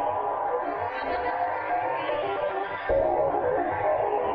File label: Tonal Re Draw, sparse soundscape